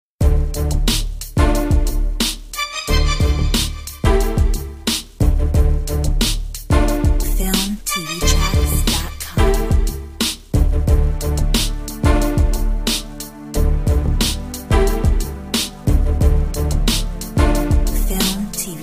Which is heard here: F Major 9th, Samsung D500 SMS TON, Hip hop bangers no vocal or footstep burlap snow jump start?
Hip hop bangers no vocal